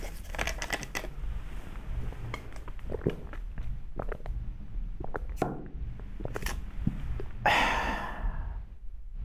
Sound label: drink; 飲み物飲む; ペットボトル飲料(立体)